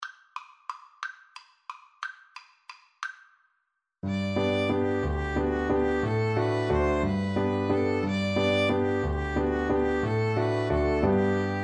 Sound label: Violin-Viola Duet